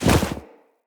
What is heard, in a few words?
Walk Deep Snow, Footsteps; Operator